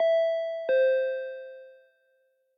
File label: Intercom; LOOPERS